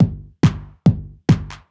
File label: DRUMLOOP TEKNO 140; Techno2